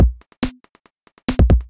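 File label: DRUMLOOP ELECTRO 140; Techno2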